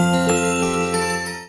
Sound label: cow